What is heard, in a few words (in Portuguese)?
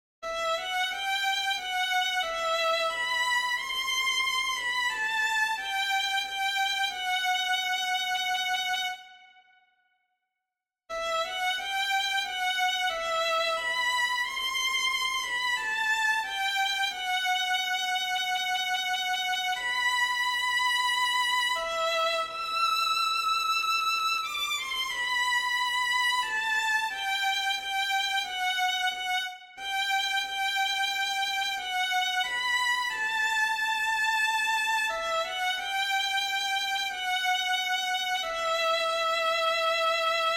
Balade violino 2